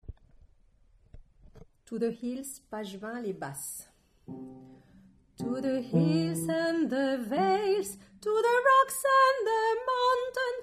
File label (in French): basse